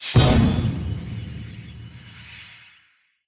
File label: free Spin Retrigger; mythic maiden